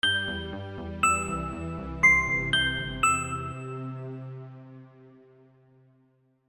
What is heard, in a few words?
chime